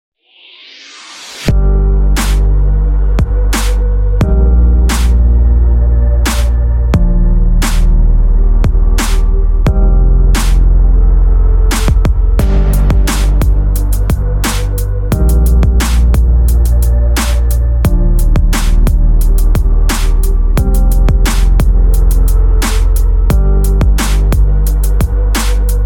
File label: instrumental